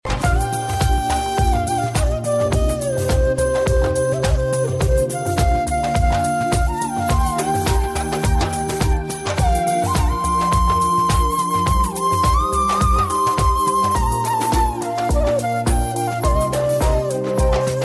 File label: Indian Flute Remix Ringtone; Tamil Ringtones; 2009 Tamil Hit Ringtones